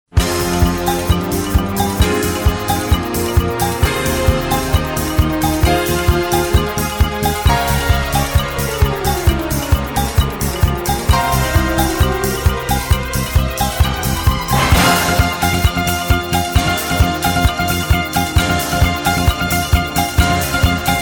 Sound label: sax